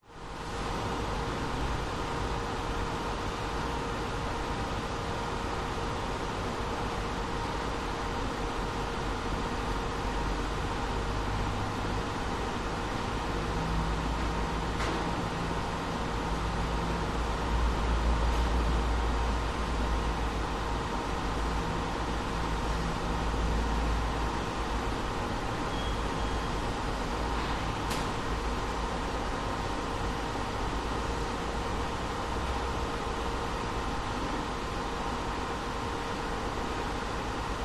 industrial warehouse ambience roomtone empty quiet hum fans occ traffic hum outsi; occ traffic hum outside; zapsplat